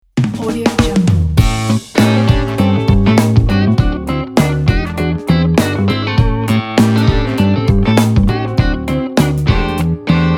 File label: funky jingle